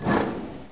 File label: flare; Flying Nightmares